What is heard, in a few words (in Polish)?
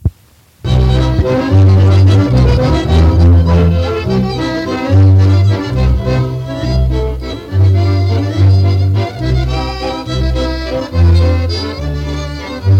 Instrumentalny; Nagranie archiwalne